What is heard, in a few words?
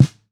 Snare Lo-Fi; Drums; Ghosthack - Free Snares 2023